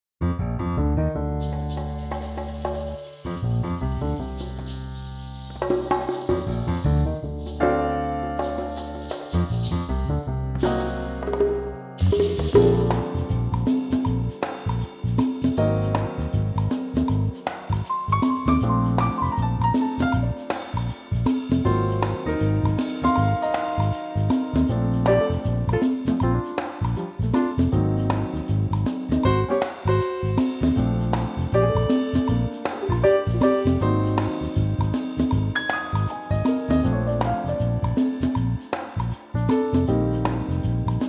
Bb trio

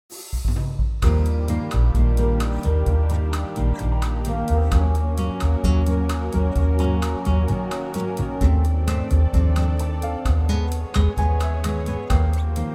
latin Eb; vocal range - Eb to G

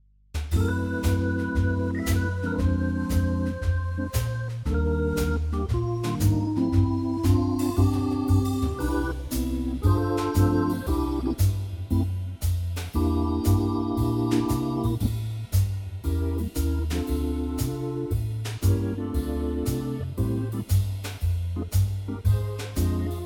C organ

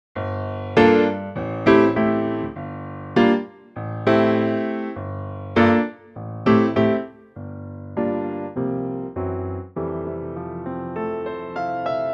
piano

